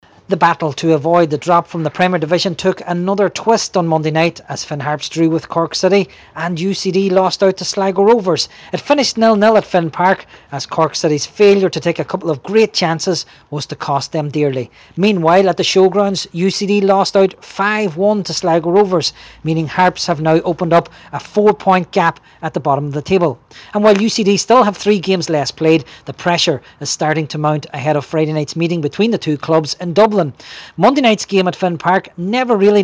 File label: Harps; Cork